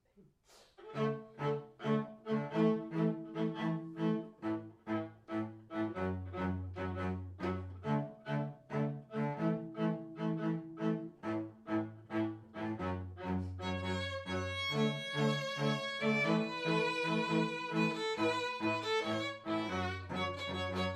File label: string quartet